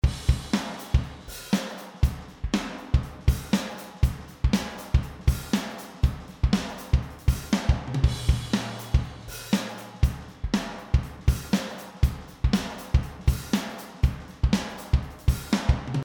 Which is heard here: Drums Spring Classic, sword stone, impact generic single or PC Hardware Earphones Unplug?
Drums Spring Classic